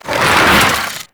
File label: Legs Roll C; Amalgam Moa Satyr; Abilities